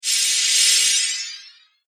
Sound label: Magic Shimmer Spell; fiction sound effects